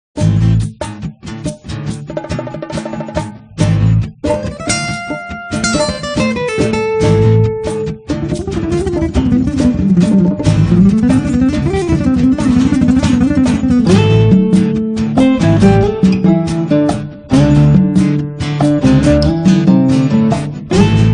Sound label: jazz 60s